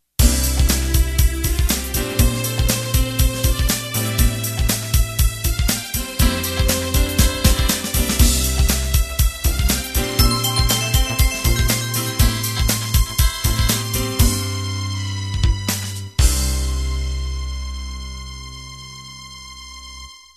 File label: Fusion End